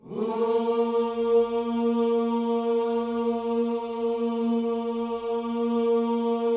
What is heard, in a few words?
WHOO A#3, Vocal Gospel; Spectrasonics; Vocal Planet Vol.1 Gospel; akai